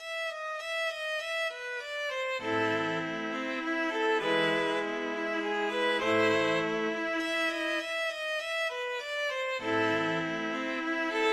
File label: fur elise, string quartet; beethoven